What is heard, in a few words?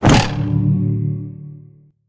Trap Triggered; The Croods